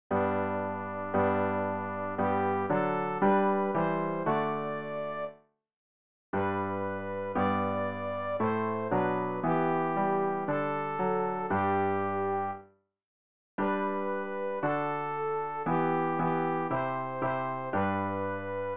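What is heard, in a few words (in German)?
sopran, Übehilfen; Ref. Gesangbuch; RG 090: Danket Gott, denn er ist gut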